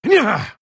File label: pain; dota2; pangolin